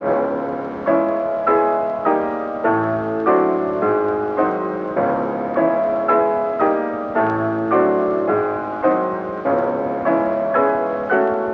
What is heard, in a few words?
Prelude in C sharp minor op 3; loc-edison